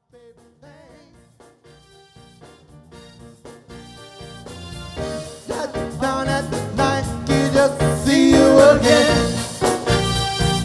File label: Dance, Cover Band